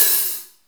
short reverb high hat sound g key, pedal hi-hat sample tuned to the G note; tuned drums